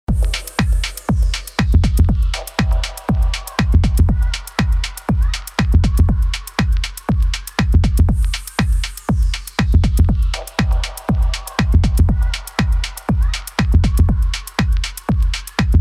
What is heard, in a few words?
Mix Buss Dark Techno active